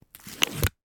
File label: food yoghurt pot lid peel open; sound effects one